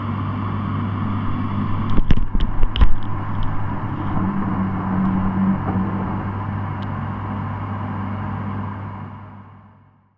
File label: Washing Machine, contact mic, mechanical